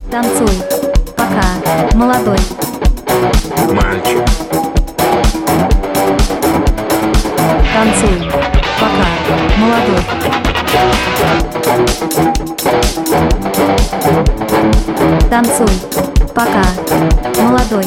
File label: dance ringtone